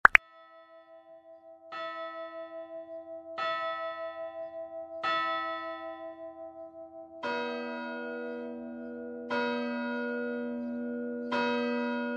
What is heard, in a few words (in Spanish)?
CAMPANAS DE IGLESIA